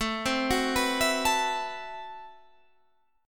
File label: A Minor 6th Add 9th, Guitar, Standard; Am6add9 arpeggiated; 17,15,16,16,17,17; JGuitar